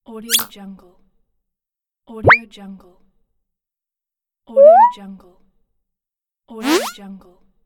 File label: playful throw; productionelements